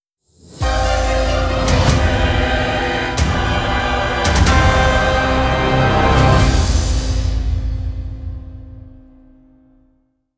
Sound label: Level Up Sting; Necromancy; RuneScape The Road to Necromancy